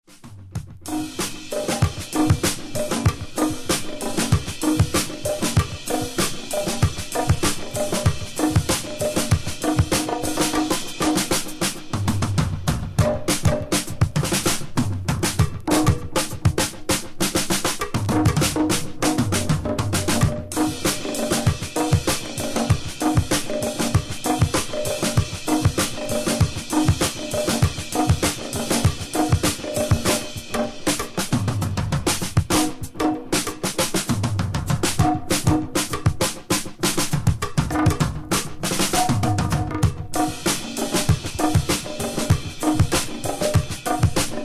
drums around

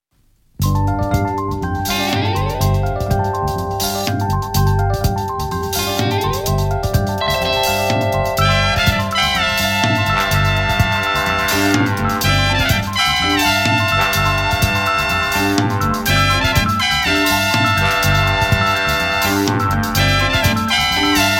shakuhachi & koto jazz funk

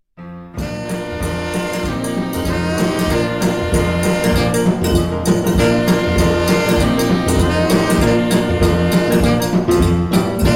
New Orleans funk

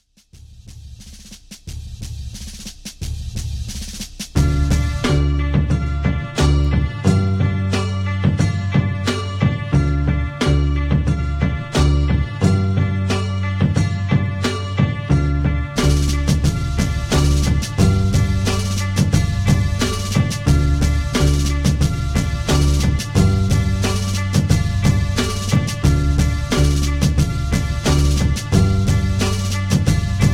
bagpipes

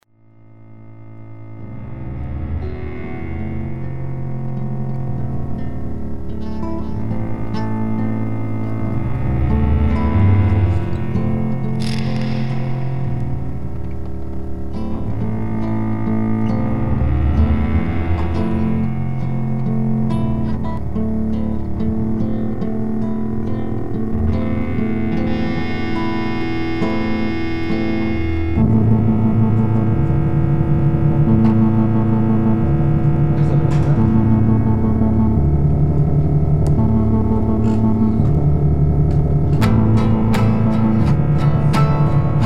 lullaby

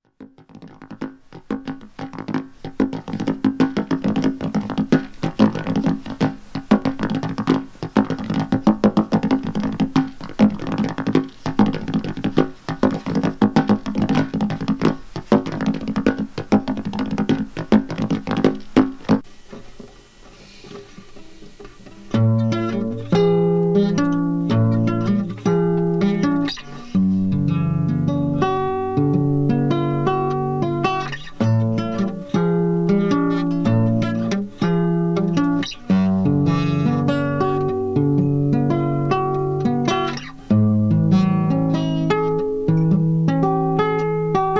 the happy guitar instrumental

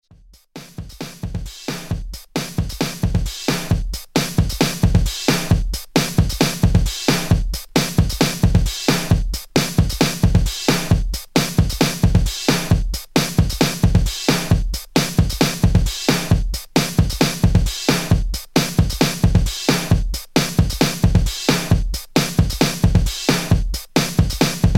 drums

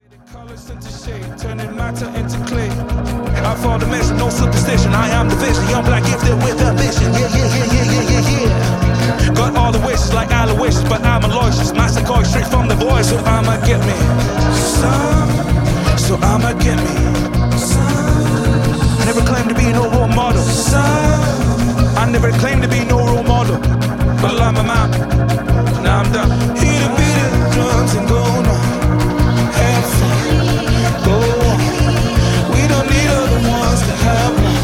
drum

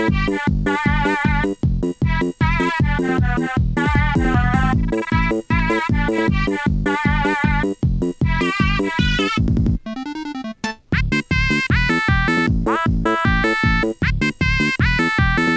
instrumental eurobeat; animal crossing

